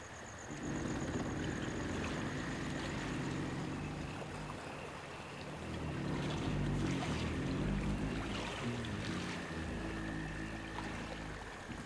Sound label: titan creak; HLD